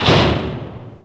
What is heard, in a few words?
door metal close; left4dead